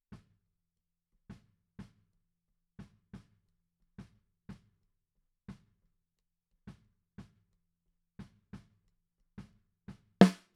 Snare Top

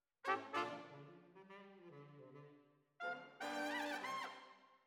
Trumpet

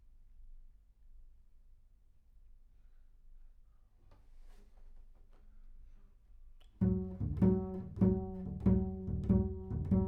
Bass Room

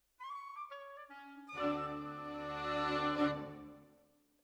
Violins, jazz for piano

